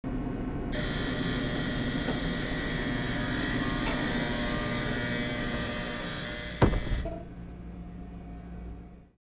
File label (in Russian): zvuk otrkrytiya i zakrytiya dverey lifta